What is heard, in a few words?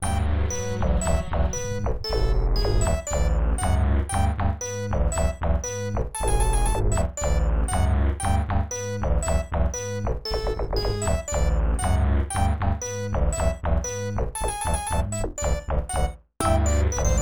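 background music sad; My Frogger Toy Trials; Nintendo DS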